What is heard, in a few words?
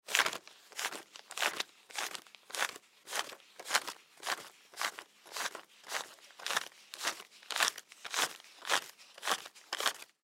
Money Bills Count, Money Bills Count Stack Dollar; Foley; Objects; Blastwave